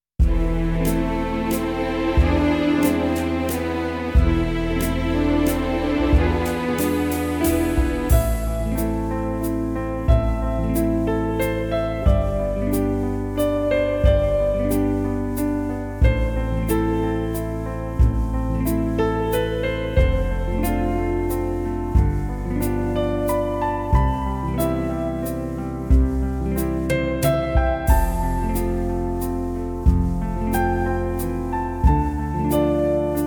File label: waltz, ballroom